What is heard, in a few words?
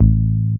DR. LOOP, BASS; X-Static Goldmine 3